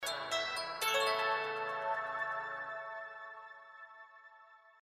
sms; Huawei C5589

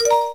cam start; LG KU3800 Bubble Pop

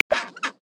Chinstrap Penguin, Polar Region; Xiaomi MIUI-14

